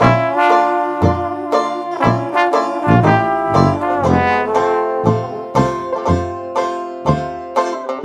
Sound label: Dixi Brass; gamerip; the dwarves; ps4